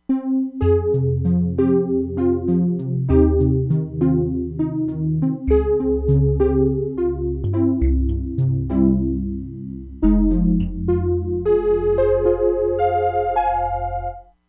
DIGITAL PIANO, Crumar Bit 99; Factory Programs

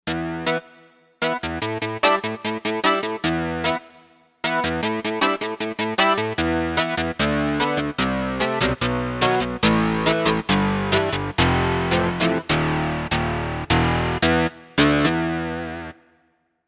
Wire; Factory Patches

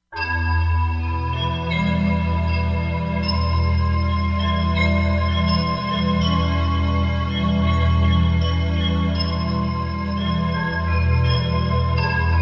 Bell Pad, Korg MSC-07S Synth

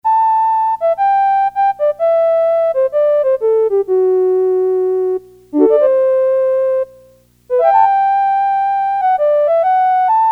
Flute, Casio CT-370; Tones

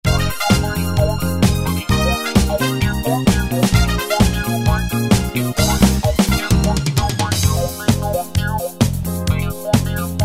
Disco; Yamaha QY70

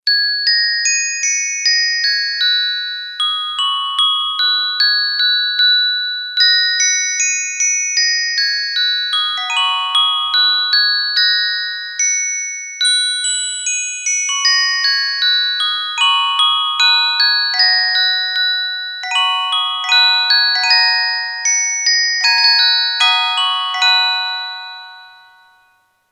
Glockenspiel, Kurzweil K2000; Kurzweil K2000 V3

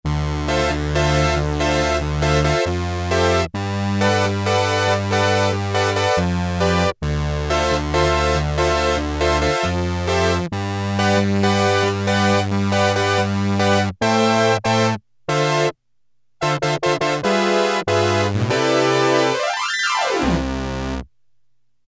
Brass Ens, Casio MT-600; Tones

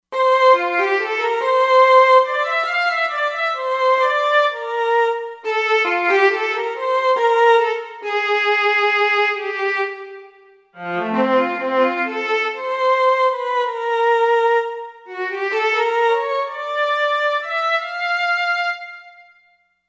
Viola

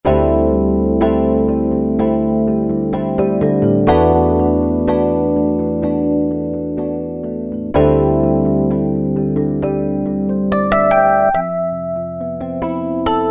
EP Knocker, Yamaha TG77; Factory Presets

